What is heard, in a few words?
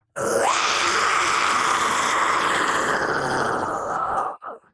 Demon beast imp monster attack shout